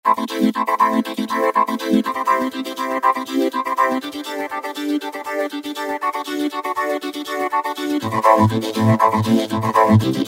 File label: Slicer, Roland V-Synth; Factory Patches; Talkn'Slicer